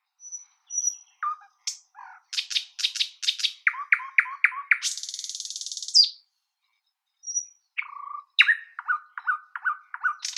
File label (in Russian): zvuki prirodi solovei uzhnii luscinia megarhynchos